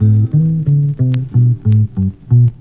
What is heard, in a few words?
bass